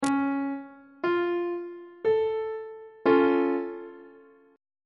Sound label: d flat augmented chord on treble clef